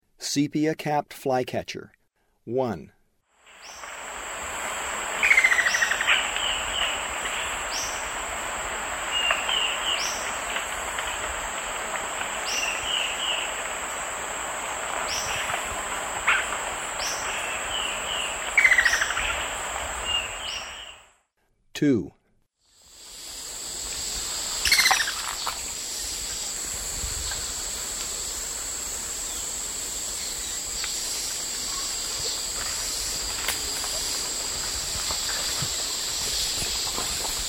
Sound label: Sepia-capped Flycatcher; S. America; Eastern Lowlands